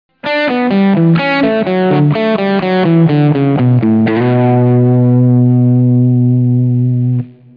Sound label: riff